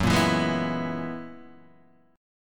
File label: Gb 11th Gb down, chord; Gb11 strummed; JGuitar